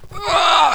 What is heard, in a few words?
burn; openffa